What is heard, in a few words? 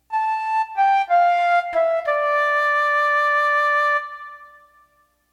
melody recognition